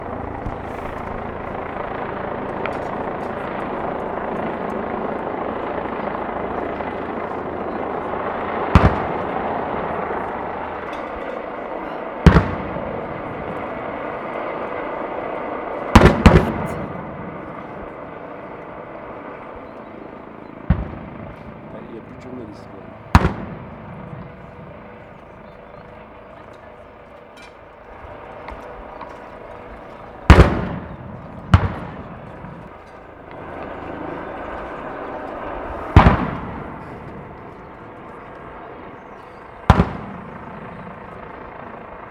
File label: explosions; quotidien sonor